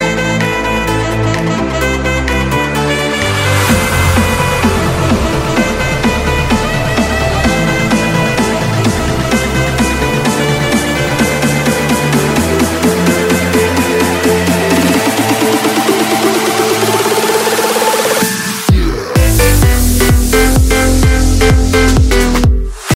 sax huawei ringtone

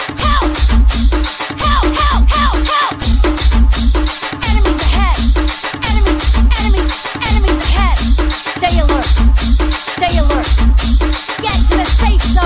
english beautiful music ringtone

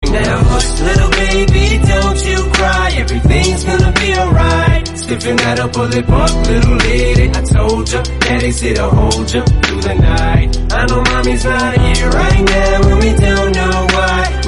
English song tone ringtone

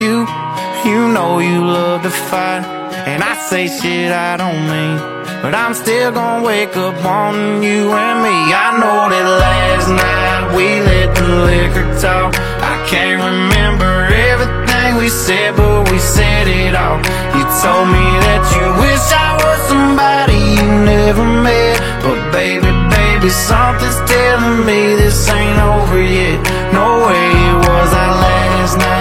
romantic english song tone ringtone

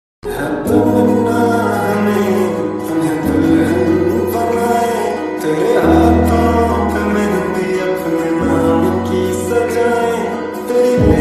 pakistani drama ost tone ringtone

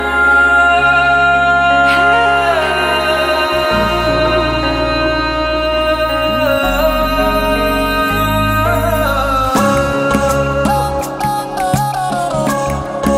romantic music ringtone, bollywood; bollywood ringtones

